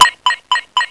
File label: activate, LazerTag